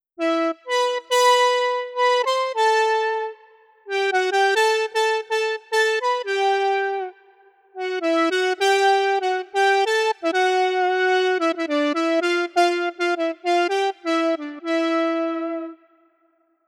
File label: saw